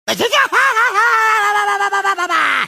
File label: laugh long; tf; crash